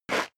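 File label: step dress boots concrete grit slide short, footsteps; schritte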